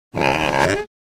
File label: Boar Snort Single Sno